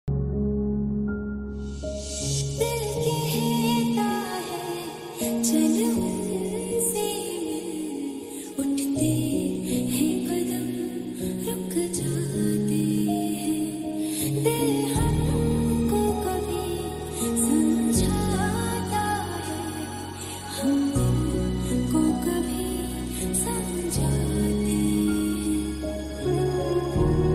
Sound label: remix ringtone sad ringtone